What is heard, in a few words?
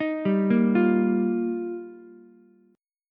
F 7th Suspended 2nd Suspended 4th, down, Ukulele; F7sus2sus4; arpeggiated; JGuitar